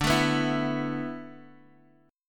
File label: E Suspended 4th, D; strummed; Esus4/D